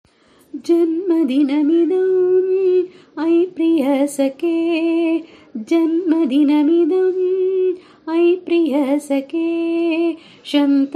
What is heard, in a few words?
Sanskrit birthday song; Thirunakshatram; malurbalambikatemple